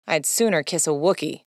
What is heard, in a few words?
kiss